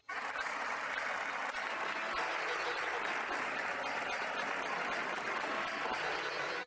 Castanets Violin Applause

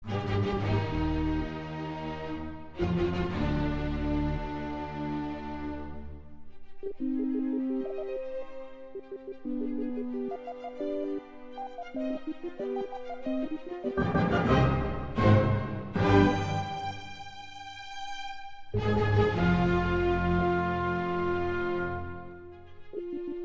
Sync Sine Soni

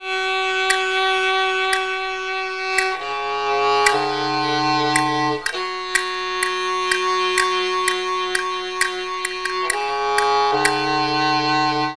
Castanets Violin; TSMtoolbox

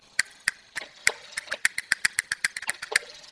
Castanets Violin

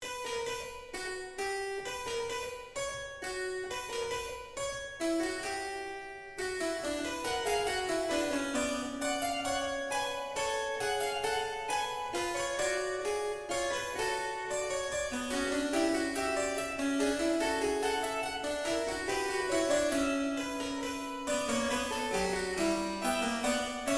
Sync Wavetable Soni

